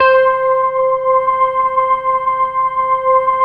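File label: synth Morpheus; Sound & Vision - Gigapack II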